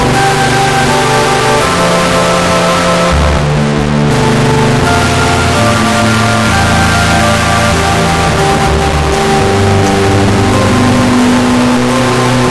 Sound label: RINGTONE